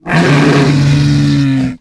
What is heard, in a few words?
Tric Call, Dino